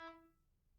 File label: Grand Piano Main Release E3, Kawai; Chordophones; Releases; Legacy